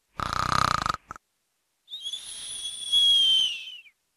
Snoring

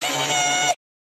Small Servo Motor

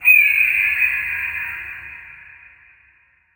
pterodactyl screech; Mike Koenig

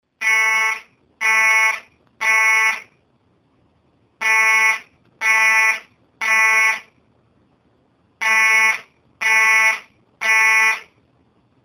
School Fire Alarm; Cullen Card

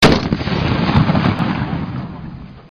barrett m107 sniper rifle

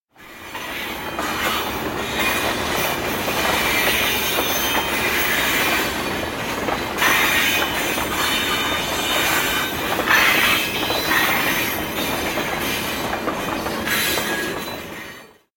Freight Train

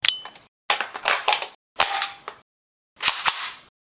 Lock in Load M4; Ghost Rider

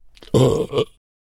Belch

